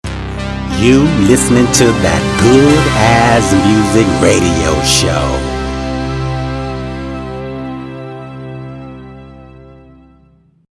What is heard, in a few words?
Radio Show Intro